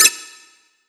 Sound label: cursor change; ExperimentalGear; titlescreen